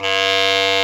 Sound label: WND, CB Clarinet; Roland LCDP04 Orchestral Winds; Lo Clarinets